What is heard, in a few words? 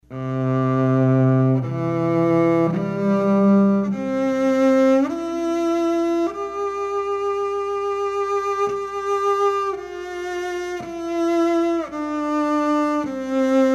acoustic